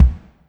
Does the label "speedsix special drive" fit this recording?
No